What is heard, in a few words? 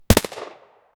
loud realistic firearm, shotgun